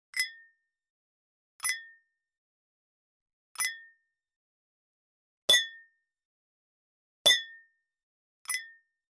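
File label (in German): Gläser aneinander, glassware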